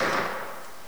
mp40 ringout; WAR

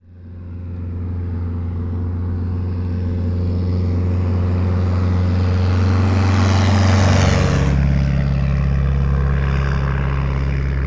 plane distant passing; WAR

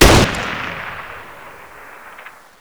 kar98; WAR

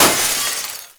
glass shatter; WAR

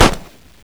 30cal; WAR

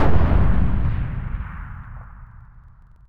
allied sniper distance; WAR

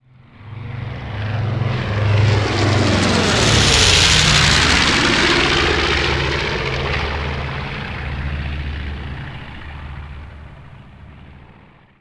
fly by; WAR

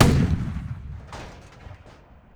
tank gun; WAR

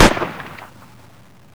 30cal; WAR

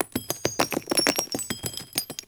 mg42 multi shell; WAR

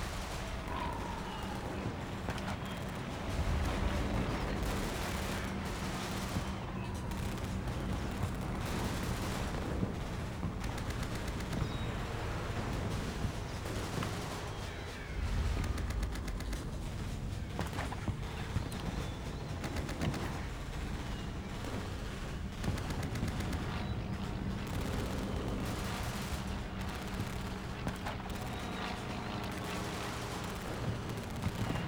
in battle; WAR

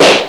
luger; WAR

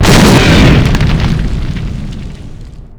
boobytrap explosion combo; WAR

